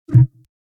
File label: hover